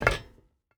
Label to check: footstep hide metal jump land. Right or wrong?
Right